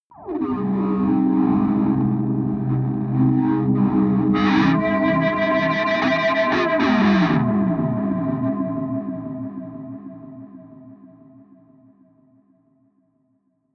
atmospheric feedback distortion hard, drones; musikelemente

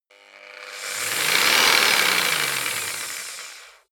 jigsaw engine medium slow short; maschinen; saege

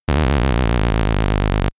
power loss

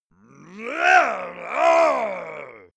monster male growl aggressive; kreaturen

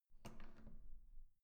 door handle down soft

